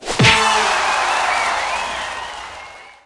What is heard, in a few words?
New High Score; Subway Surfers City; Android, iOS